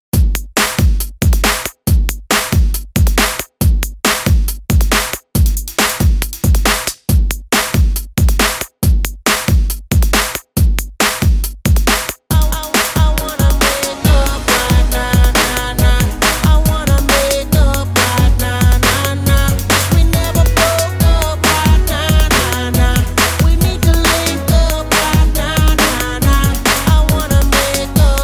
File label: bpm 138